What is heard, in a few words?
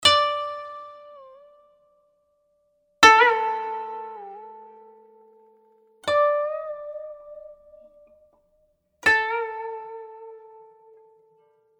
koto